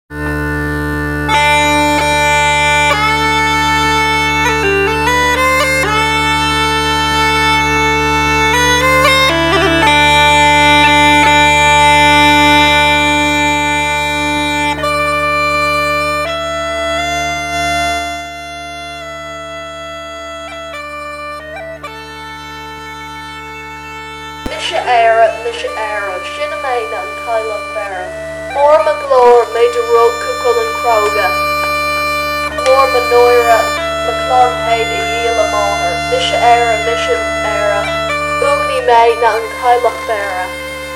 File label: Slow Air